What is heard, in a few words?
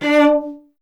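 strings cello D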